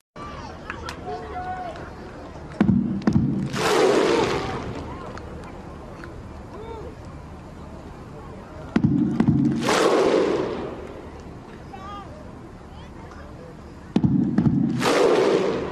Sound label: iceland viking chant